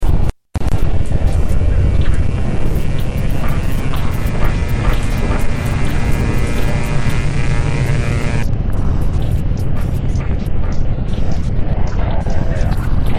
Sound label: noiser